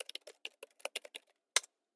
Keyboard

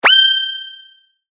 sword

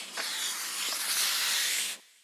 paper

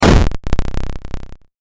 bomb

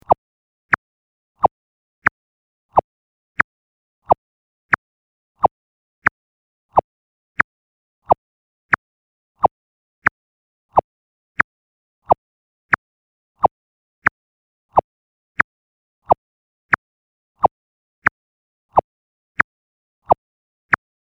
anime walk

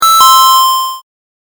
correct; ゆっくりのピンポンを太くしたverの超高音ver; 正解の音・ピンポーン

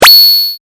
game effect

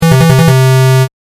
correct; ３連続の正解・ピンポンピンポンピンポンの超低音ver; 正解の音・ピンポーン

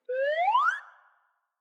whistle UP

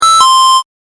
correct; ちょっとゆっくりのピンポン; 正解の音・ピンポーン

